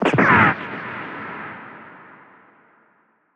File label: Gunshot, cartoon Foley Fx; musicradar; cartoon caper